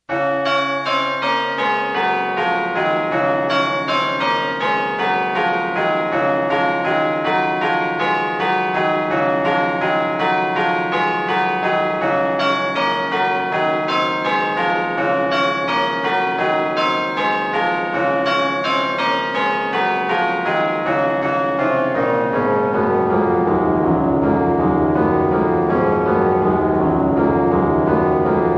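American Bells Celebration Peal, Digital Carillons; electronic carillon bells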